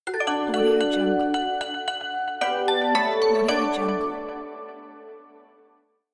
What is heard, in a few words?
christmas notification